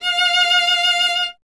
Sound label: STR VLA, Solo Instruments